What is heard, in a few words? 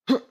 jump; hello neighbor